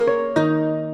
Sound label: lyre; code game jam drop plafond 2025